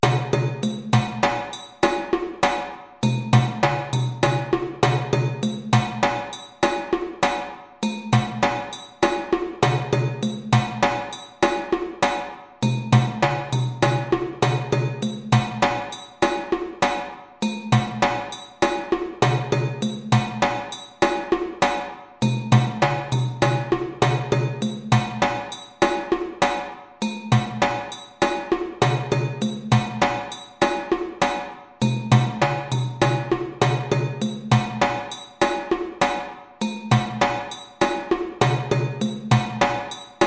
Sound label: Toro Groove 100 bpm, djembe